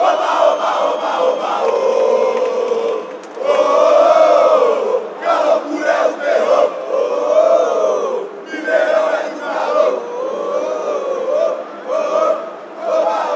oba oba, chants

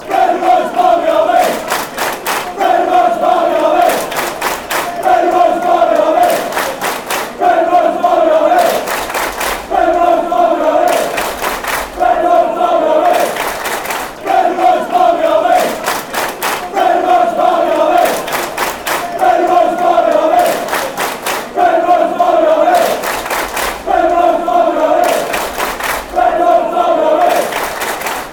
barmy army claps; brendan rodgers

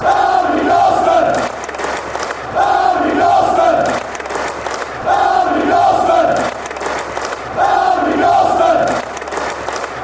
clap; andy dawson

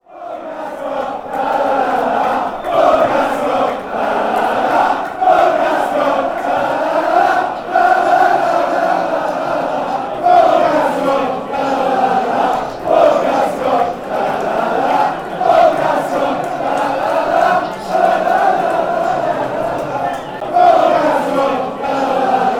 lalala lazio chant

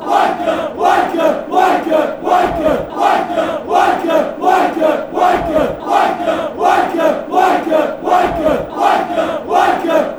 wnker, fan chants; dcfc

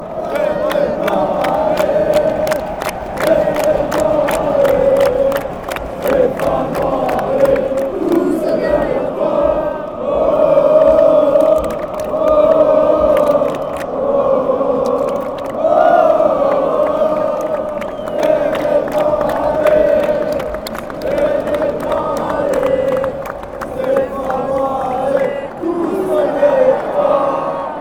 allez allez, chants; stephanois; Association Sportive de Saint-Étienne Loire

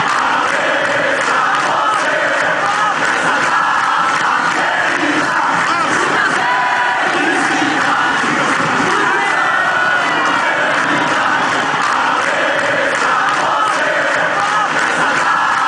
happy birthday fanchants

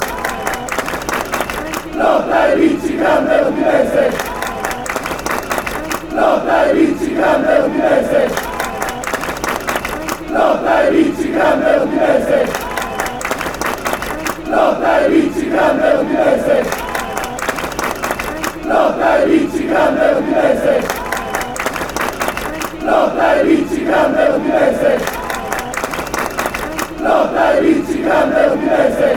great fanchants

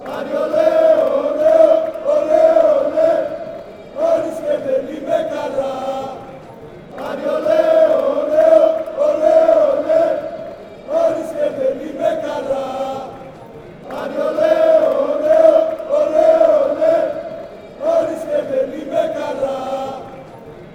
ole ole, fanchants

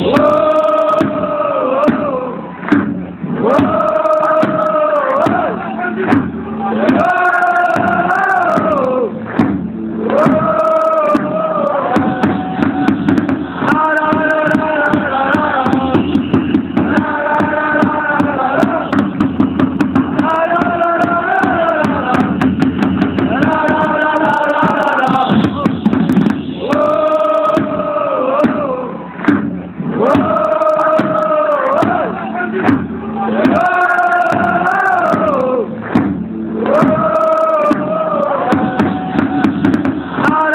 dale, chants; cavese marseillais

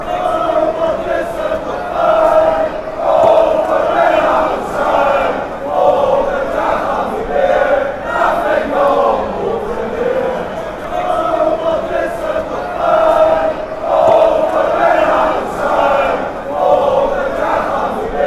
den haag chant

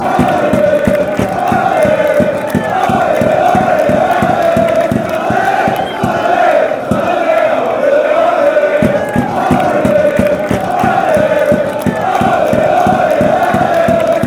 chants; stade rennais

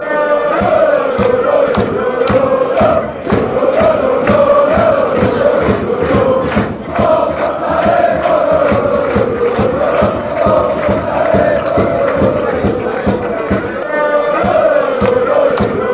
palermo fanchants

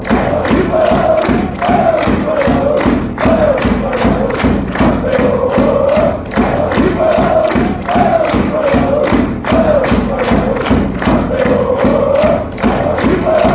come on, chants; valladolid

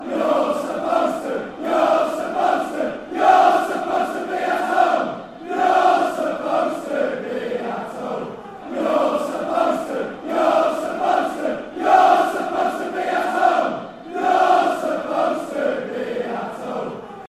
youre supposed be home fanchants; scfc

